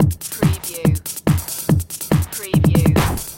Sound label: Techno-Trance Drum Loop 142 BPM